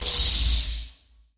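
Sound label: sounds of magic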